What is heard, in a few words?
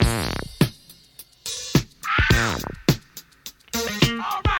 105 bpm modern breakbeat a sharp key, Free drum loop sample - kick tuned to the A# note.